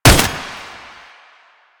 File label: Tank Turret Shot; Warframe; Lasrian Tank; Weapons; Pintel Turret